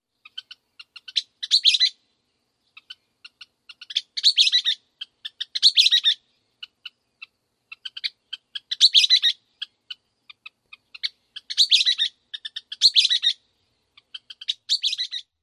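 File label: western kingbird dawn song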